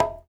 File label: Congas, African Contemporary